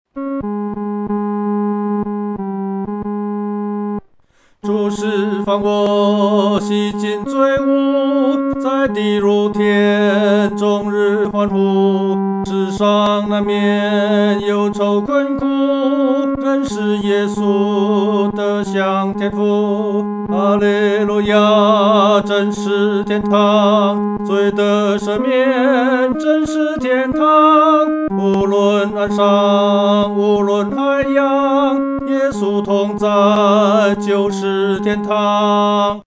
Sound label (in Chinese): 独唱 第三声